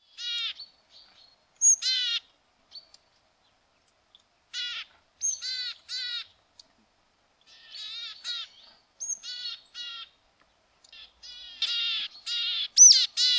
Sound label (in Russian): burogolovaya gaichka golos